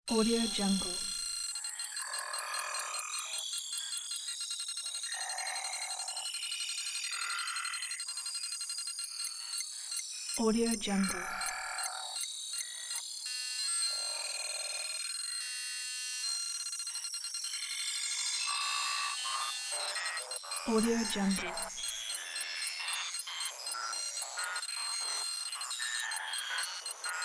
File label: hitech cybernetic gear